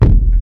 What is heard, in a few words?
old school boomy kick single shot f key; kick drum samples